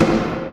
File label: ax impact metal; triple-aaa-games; insurrectionist